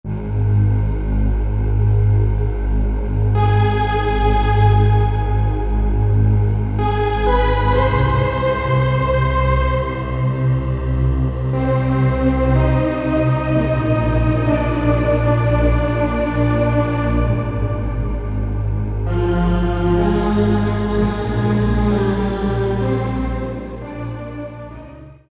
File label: Roland U-220; Factory Patches; Sacred Tree